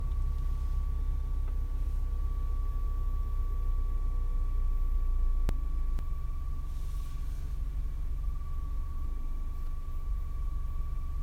high pitch continuous sound, mini 2015; f55-f56 hatch

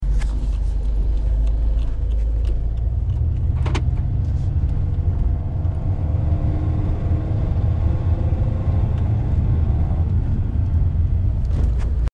weird noise and hesitation r56 noise; no codes; hatch

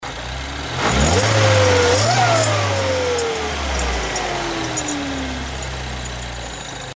howl, mini; gp2

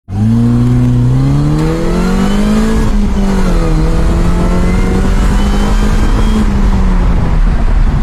r53 windows down; hyper blue havoc

